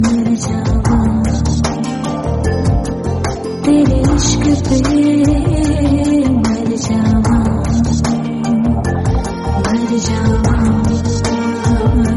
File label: soft romantic song tone ringtone, Bollywood